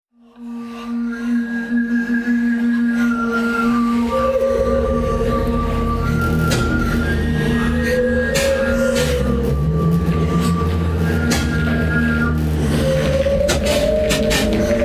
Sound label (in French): Sonate pour souffles et piano hospitaliers